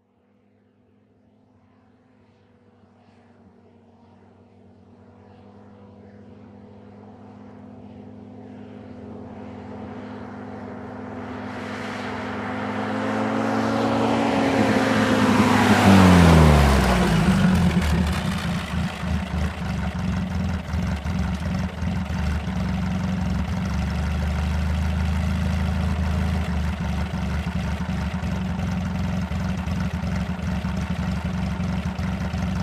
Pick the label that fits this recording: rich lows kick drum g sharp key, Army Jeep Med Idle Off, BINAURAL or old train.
Army Jeep Med Idle Off